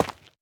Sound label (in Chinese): 滴水石 破坏声